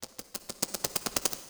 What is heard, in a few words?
Stepper Steps